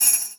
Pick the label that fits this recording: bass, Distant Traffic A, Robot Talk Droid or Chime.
Chime